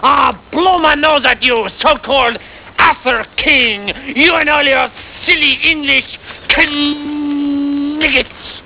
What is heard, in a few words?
blow nose; Television; Monty Python; Television Favourites